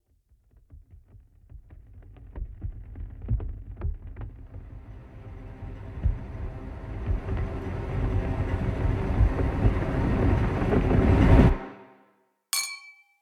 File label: transition suspense